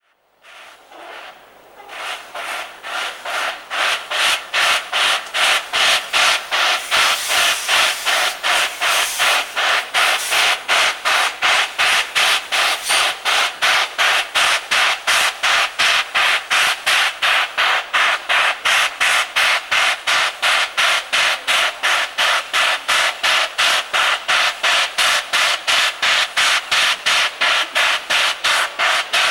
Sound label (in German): dampflok sound china